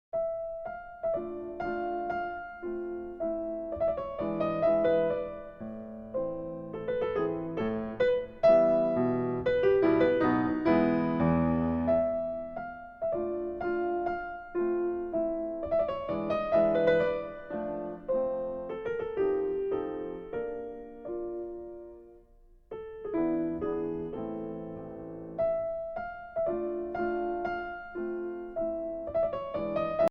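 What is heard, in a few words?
Mazurka in A minor, piano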